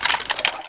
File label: forearm, weapons